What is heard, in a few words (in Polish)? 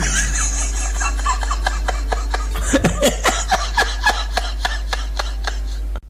smiech